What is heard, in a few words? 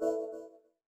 Windows Battery Low; Glass